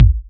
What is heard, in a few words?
boomy steel kick drum sample G sharp key, bass drum one shot tuned to the G# note